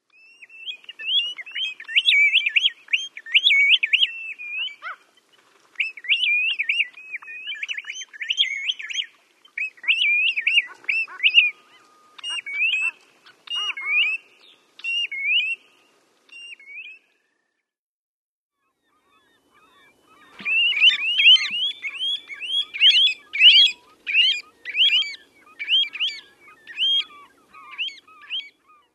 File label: Pacific Golden Plover